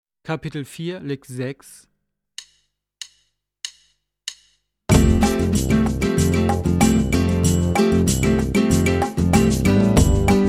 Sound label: Latin Style Guitar